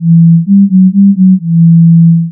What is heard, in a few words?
Sine Gen Eq Mod; SoundGen